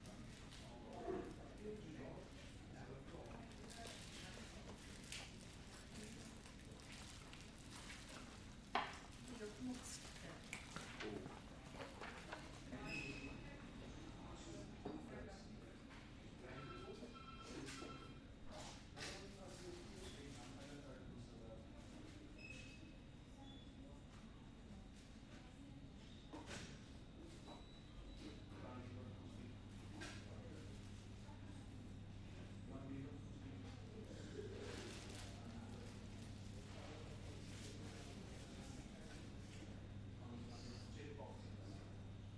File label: Office Ambience